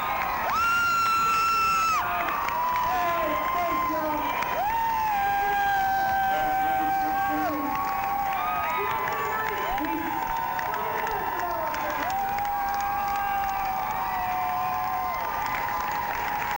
crowd

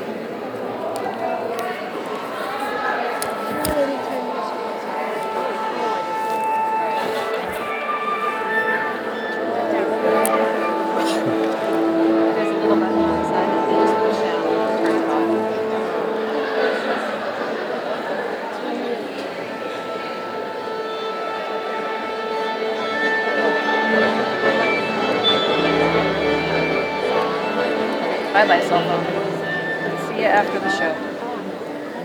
warm up

